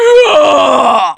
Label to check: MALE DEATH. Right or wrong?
Right